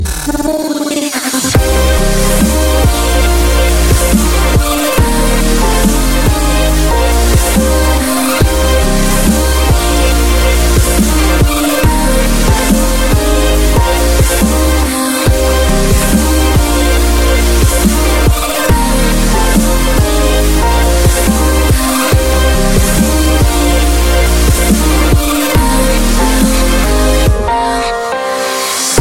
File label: dubstep, ringtones; electronic